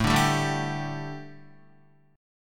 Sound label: A 7th Suspended 4th, A, down, Guitar